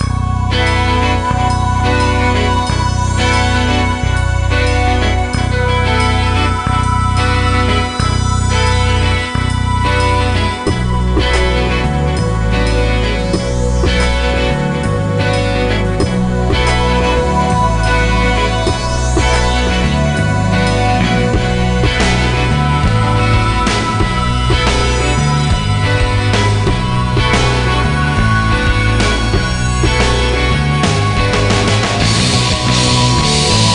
Winter Music Loop; bigfoot collision course; nds

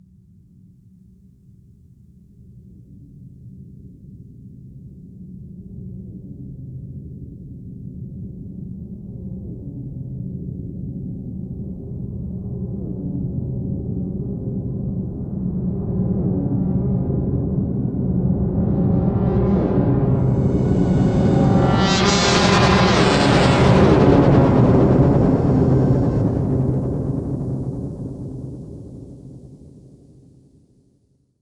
Horn

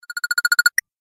Alert

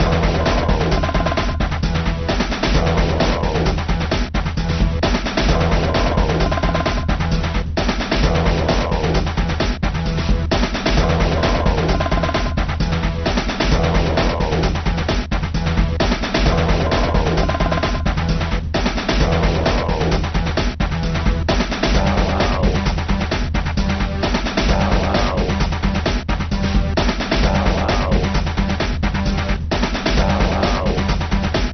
Action; Sly Cooper and the Thievius Raccoonus 2014 PS Vita

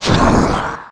Monster Golem type Death; Inotia 3

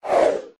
Zoom

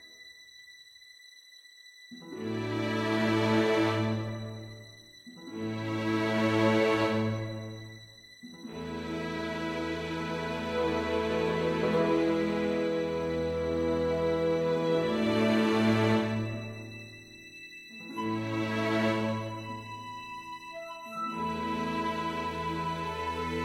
Ambient Theme

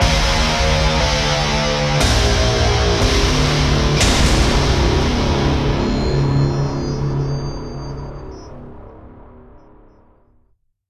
Player Death (Inst); Killing Floor 2

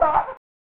"Thah-hah!"; theme park 1995 snes

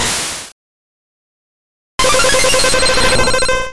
Hyper Beam; sfx; attack moves; pokemon; gen 1; rby

